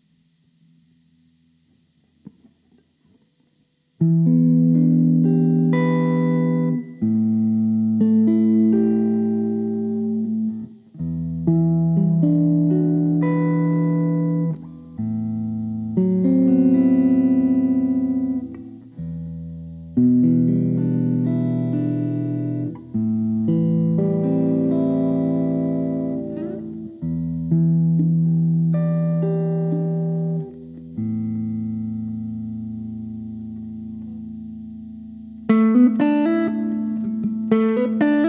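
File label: Em 120 electric guitar solo wet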